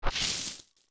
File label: steps tall grass jump; Jumping and landing in Tall Grass (adult); OOT